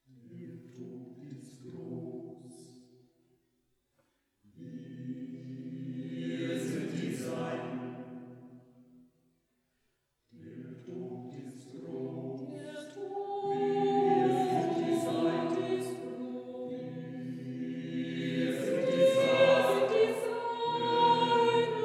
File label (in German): Chor Schlussstueck